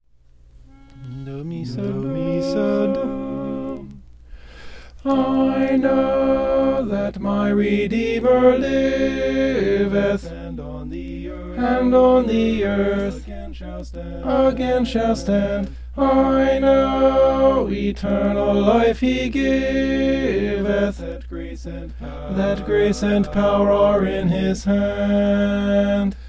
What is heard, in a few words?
tenor; i know that my redeemer liveth